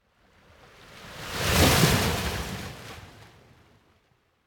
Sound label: Ocean Strong Wave On The Coast Surf Water Lap; Hollywood Edge; sound designer's toolkit v2